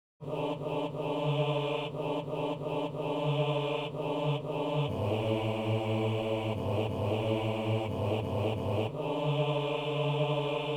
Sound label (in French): basse